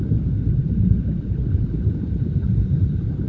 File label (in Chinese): 地牢; Environment